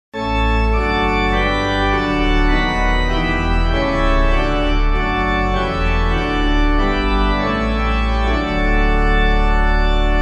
organ, hymn; All Ye Who Seek a Comfort Sure; Caswall; SAINT BERNARD; Clyde McLennan

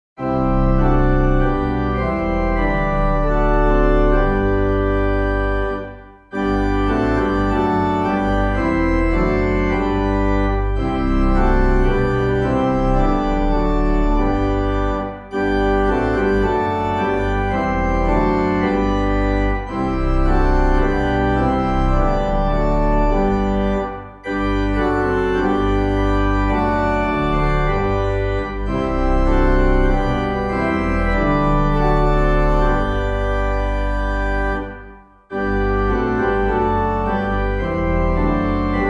organ; Faith Begins by Letting Go; Daw Jr.; DIX; Clyde McLennan

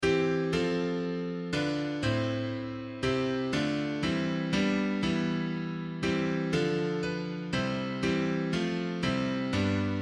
piano

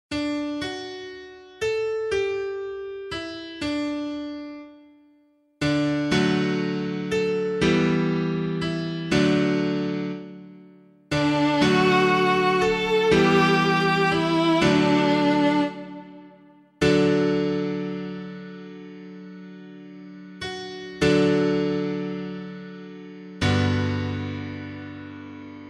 Psalm, piano

